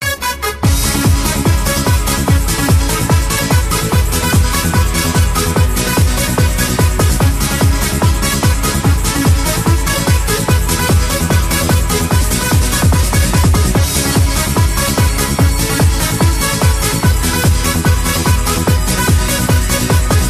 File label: techno